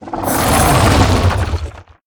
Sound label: Attack; Earthen Guardian